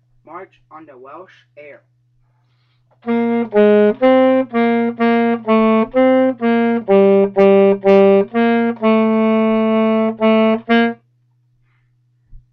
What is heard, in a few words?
Saxophone